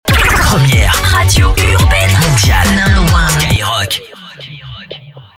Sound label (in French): JINGLE RAPIDE; SKYROCK